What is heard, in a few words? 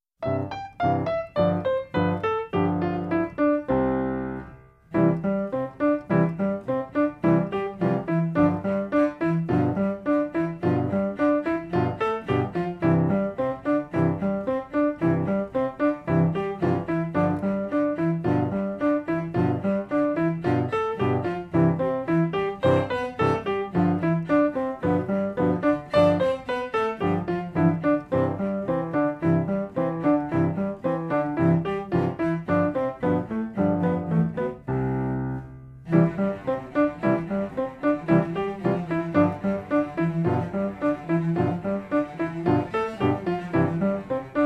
Etude Band